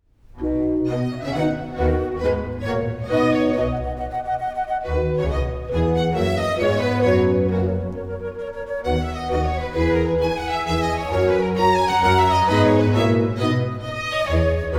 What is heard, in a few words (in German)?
Arie Sopran; Singt dem göttlichen Propheten